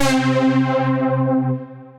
Full Force Saw, Synth Lead

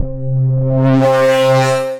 Electric Swell, synth lead; Ableton; Glitch and Wash

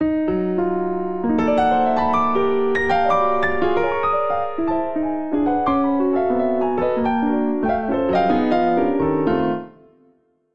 Phrase Free Angular 77 bpm, Grand Piano; MIDI Clips; Ableton; Factory Packs

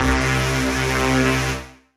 P-50 Linear; Ableton; Retro Synths; P-50 Structures

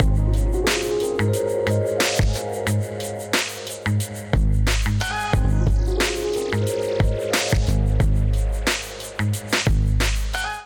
Kit Melodic 90 bpm, Drums Complex; MIDI Clips; Fly Situation; Ableton; Chop and Swing

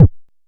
analog quick, Kickbank

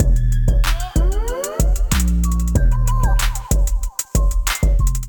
Digi Kit groove 94 bpm; MIDI Clips; Ableton; Factory Packs; Beat Tools

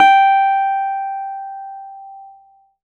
Model-C G4; Keyboards; Ableton Foundation FreePacks

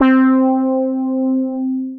piano guitar; Synth Keys; Ableton; Creative Extensions; Poli

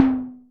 Syntom, Operator Percussive; Instruments; Ableton; Synth Essentials

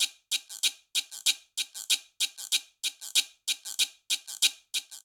Guaguanco 2 Guiro 190bpm; Latin Percussion; Ableton; Factory Packs

